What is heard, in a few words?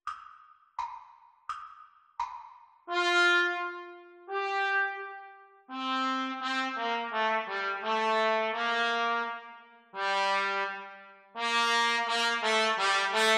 Trumpet Duets; Grade 2; Exam Speed; Cornet Duets